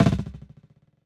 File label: SNARE BUZZ